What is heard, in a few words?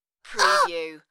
Wimpy Ah